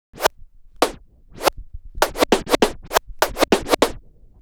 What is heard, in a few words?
Scratch, Trance